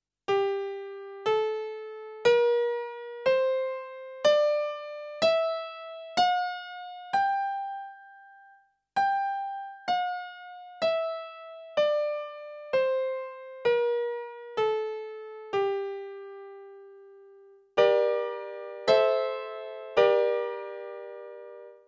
Porcupine symmetric minor 22edo

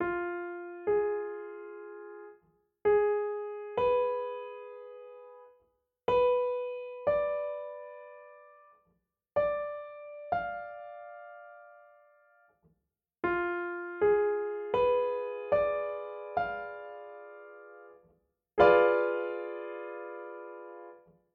Starling Tetrad 12edo

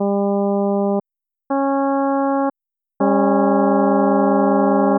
Alphorn, Fa

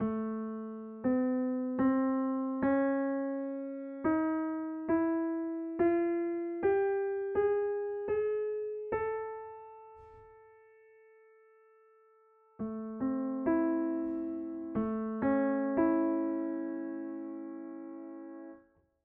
Magic10-19edo

